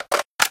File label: m4a1 clip in; garrysmod